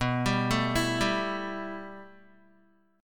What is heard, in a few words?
B 7th Suspended 4th Sharp 5th, Guitar; B7sus4#5; arpeggiated